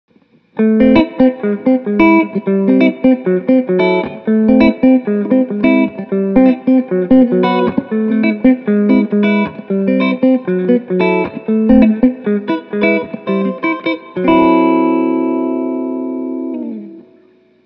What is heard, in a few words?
Both Springs Light Blend